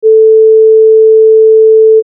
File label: sin 16